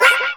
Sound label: SE GAME BREAK; maimai